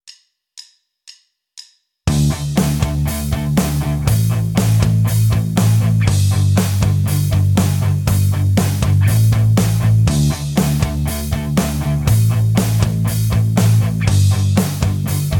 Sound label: Tapped Harmonics, guitar; Rockschool; Guitar Techniques 2019 Issue 290 January